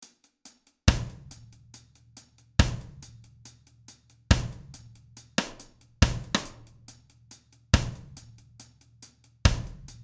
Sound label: One Drop 140bpm, reggae